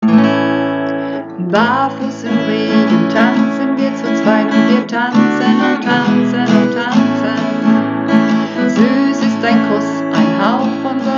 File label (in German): Hauptstimme, Uebungsaufnahme